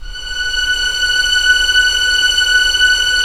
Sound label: STR, Roland LA Composer